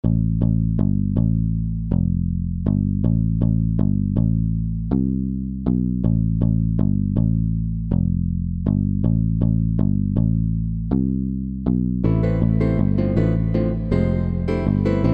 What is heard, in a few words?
alabama no drum